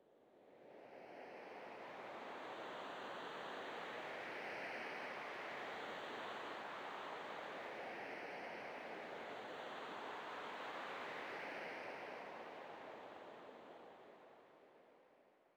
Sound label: sting gentle wind; Rust